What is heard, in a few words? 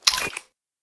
bear quickdraw, detectors; STALKER; IWP